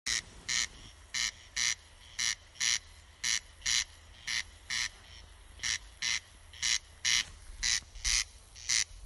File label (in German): Rufe des Wachtelkoenigs